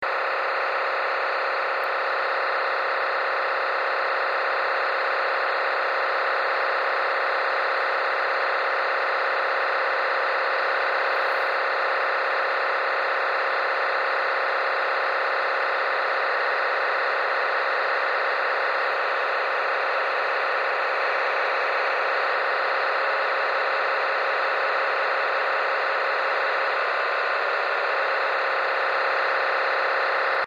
Connect Plus Control Channel

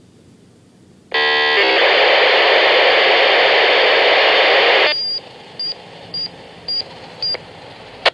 ProVoice Raw Encrypted

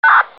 Saber1200

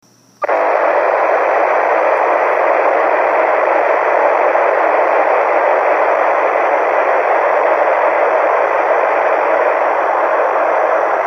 Moto IMBE ASTRO

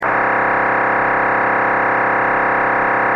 DMR BURST

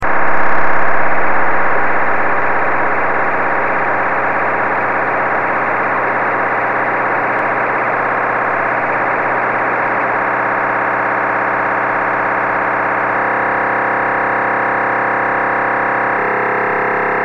DMR TDMA

